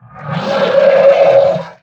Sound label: sucker growl, bloodsucker; russian; sven; russian sounds